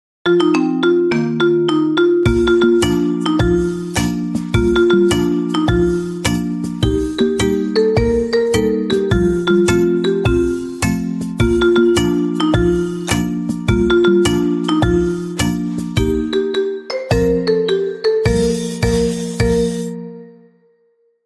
Round and Round Marimba; iphone 着信音; squid game 2

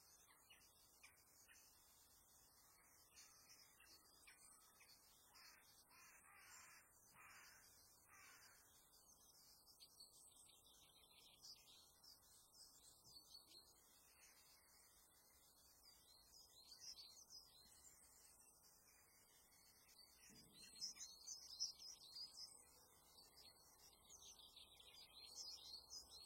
Summer Bird

